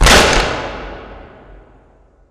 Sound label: Assembly Plate Lift Impact Metal Close; Boomtown; Titanfall 2